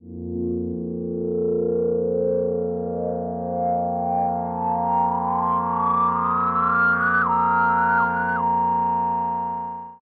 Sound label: asynchronous atmosphere